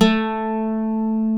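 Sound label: Bouzouki A2 left; AKAI S6000 CD-ROM - WorldSounds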